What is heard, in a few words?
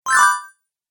Accept Sweep, transition